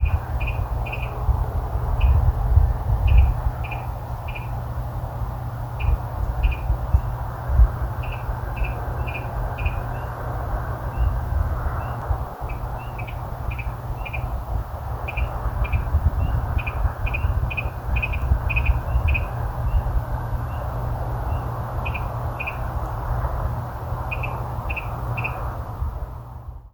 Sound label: unidentified call